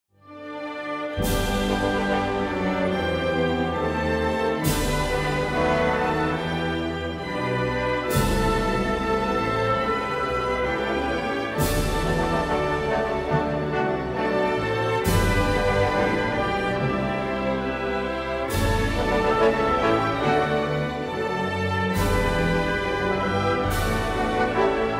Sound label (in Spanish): Himno de Curazao